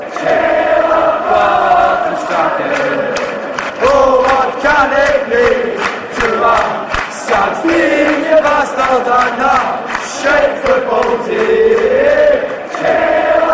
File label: cheer up, chants; gordon strachan